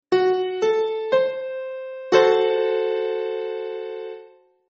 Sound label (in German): Fis verm, konzertina; kunstklang